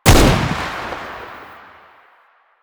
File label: AK47 Fire; Warframe; Tenno; Weapons